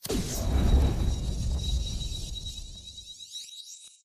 boost hover body whine; Titanfall 2

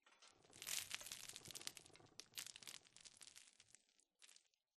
Rock Debris Small Long; Boomtown; Titanfall 2

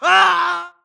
grunt death crush; Titanfall 2

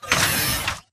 Mvmt Servo Light Medium; AI Stalker; Titanfall 2

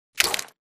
bullet light ballistic mud debris; Titanfall 2

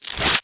imp bullet energy bubbleshield; Titanfall 2

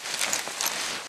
wounded drag; Titanfall 2

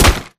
bullet light ballistic spectre; Titanfall 2

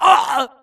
grunt death neck; Titanfall 2

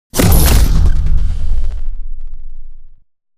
bt embark casual behind grab; Titanfall 2

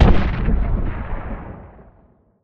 rocket explosion far; Titanfall 2

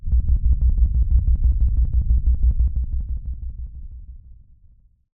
Explosion Rumble Exterior Close LFE; Boomtown; Titanfall 2

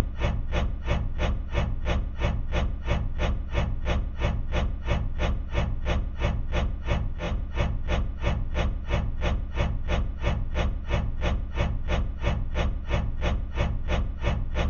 fan blade; boneyard; Titanfall 2

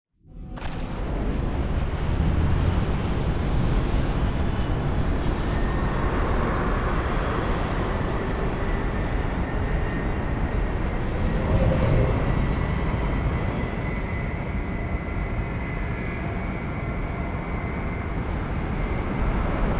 ending fleet; corporate; imc pov; Titanfall 2

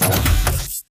Servo Jog; Titanfall 2

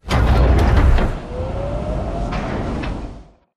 crane startup; Titanfall 2

